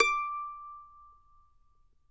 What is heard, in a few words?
mando notes D6; Sampleheads; Whole Lotta Country 1 & 2